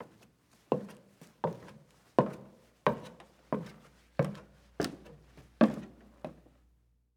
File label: Stairs Down Wood; HauntedBloodlines; SFX Producer